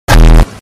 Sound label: dank bass boosted